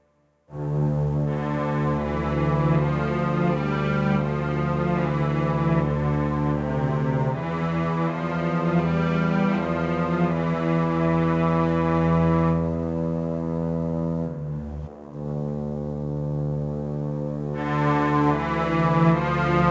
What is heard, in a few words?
Cellos, Kawai K1 preset